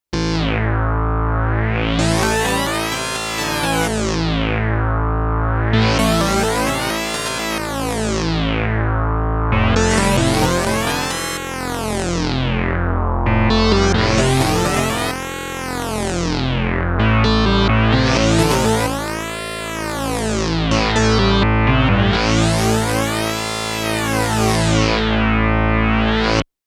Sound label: Sync Sweep